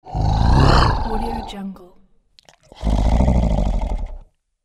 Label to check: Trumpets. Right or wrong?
Wrong